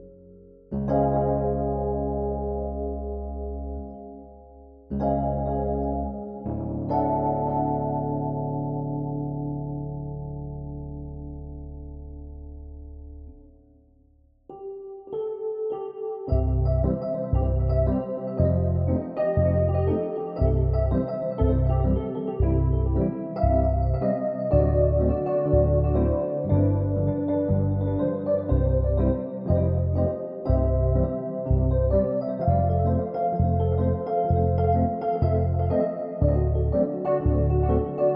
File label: Chord Vintage tine MK with Bass